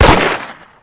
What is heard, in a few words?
revolver shot; minecraft kajetans mod